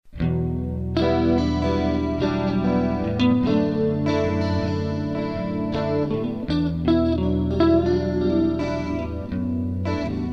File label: the wind instrumental